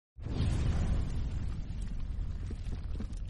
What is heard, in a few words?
dog food sounds